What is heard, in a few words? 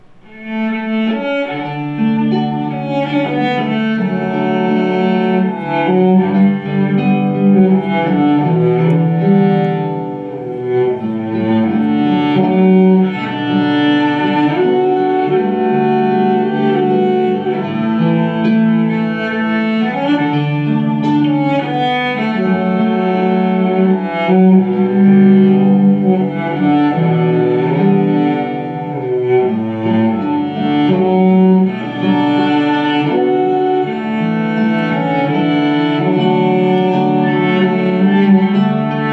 Cello and Guitar; Bluegrass Band